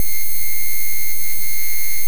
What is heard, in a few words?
Malfunction Glitch Short; Bryce Raffle Sound